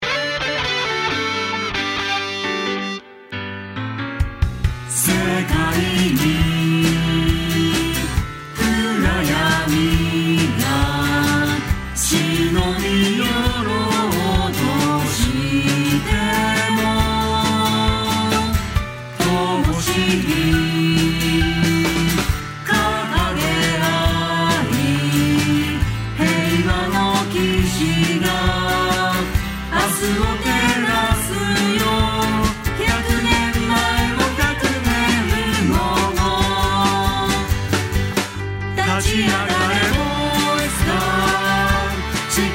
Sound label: band short singing